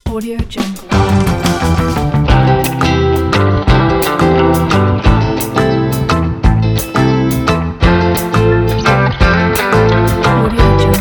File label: 50s romantic oldies